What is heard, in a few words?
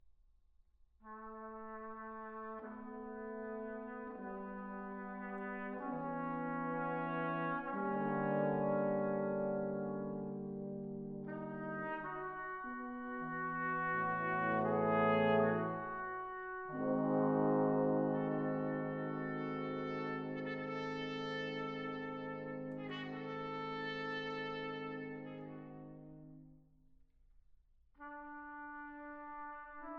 Brass Quintet - Mvt. 1. Little Requiem with Fanfares; American Visions